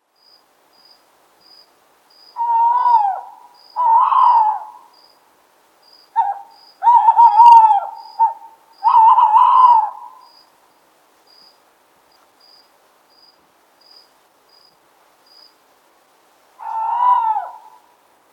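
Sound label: tawny owl voice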